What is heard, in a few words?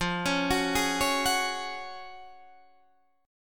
Gb Major 7th Suspended 2nd